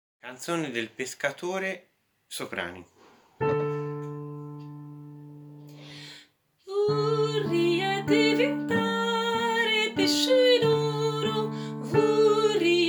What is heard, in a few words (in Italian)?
Canzone Pescatore SOPRANI